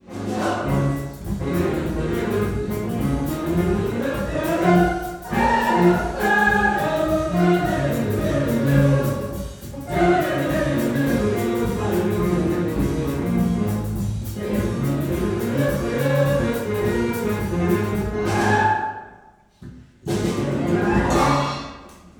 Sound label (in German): Chor; Frühjahrskonzert 2018